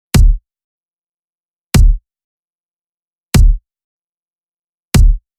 Kick B

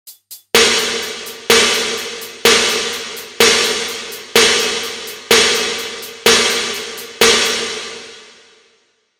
Snare reverb

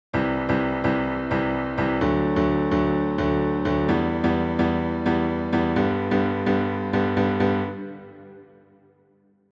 Piano backing

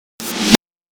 Reverse

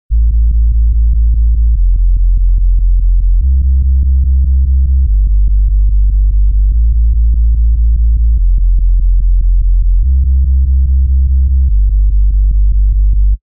Sub Bass

